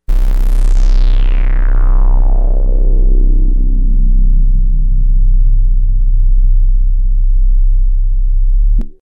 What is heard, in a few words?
subbass